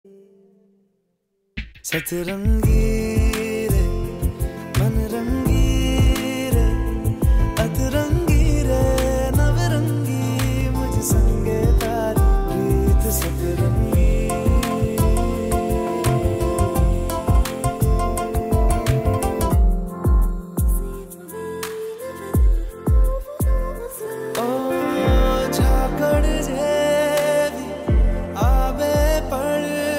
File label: Hindi Ringtone